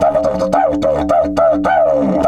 108, DIDGE 108BPM

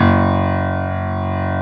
BOSENDOR F#1; East West - Global Sounds

